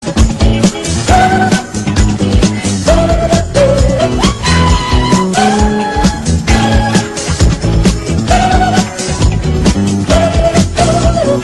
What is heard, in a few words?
papaya song